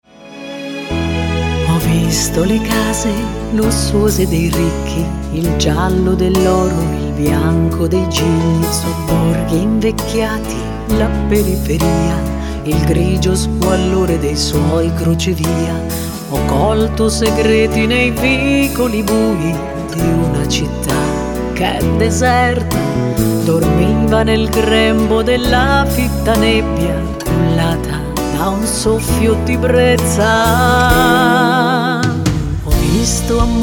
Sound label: karaoke